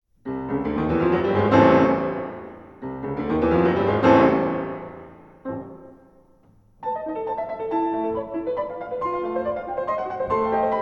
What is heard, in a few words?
etude-tableaux op36 9 a moll; rachmaninow; tanz der maenner